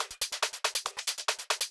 Loop 140BPM, Drums & Perc; Vengeance Samples; Incast Kit; E minor

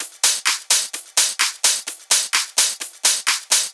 Houseloops 128BPM; Vengeance Samples

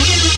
Synths E; vengeance essential club sounds-3

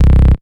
Bass C, Offbeat Bass

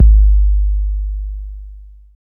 Bass Drop, 808; Street Anthems Sound Kit

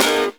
Synth Shots; Vengeance Samples

